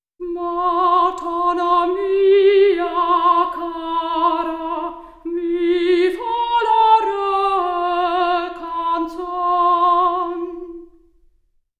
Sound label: soprano; OmniPower4296